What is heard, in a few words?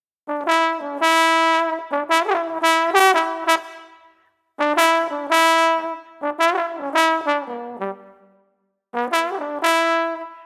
Street Trumpet Mellow 110